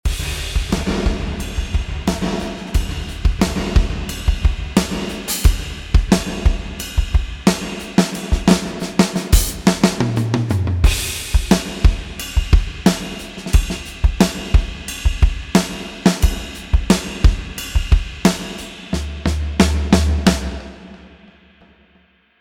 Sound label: dry wet